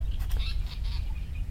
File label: flycatcher japanese paradise Terpsiphone atrocaudata